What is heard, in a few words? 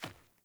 Sandals Run Dirt, Footsteps